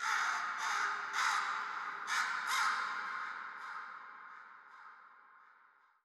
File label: crow, ambient, nature, birds; Portal 2